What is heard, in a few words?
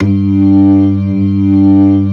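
B3 distortion, G2; VINT.KEYS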